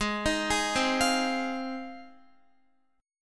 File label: G sharp 6th G sharp down Guitar, chord; arpeggiated